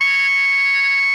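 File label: SOPRANO C#5, Saxophones, SOPRANO GROWL; GRWL; Greytsounds; Philip Wolfe David Crozier's Saxophones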